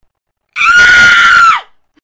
single female scream

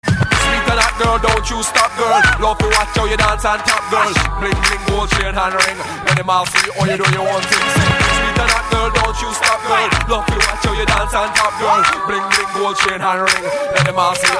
rap; alternative

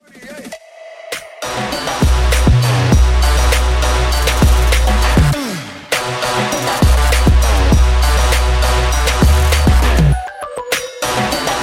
TRAP

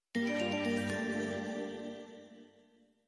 Jingle

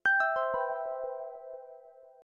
alarm; windows10

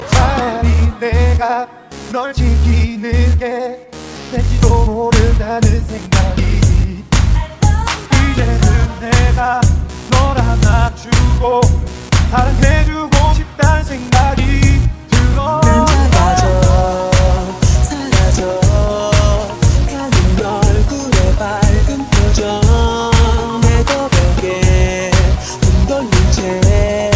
hot k pop

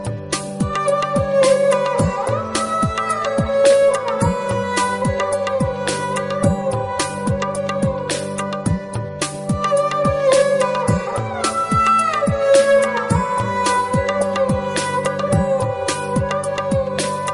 sad love violin; ringtones

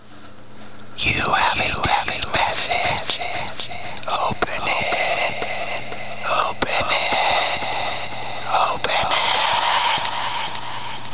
Echo Text Message

